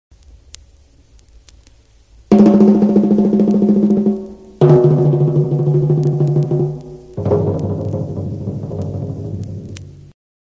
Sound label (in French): Toms aigu medium grave Percussions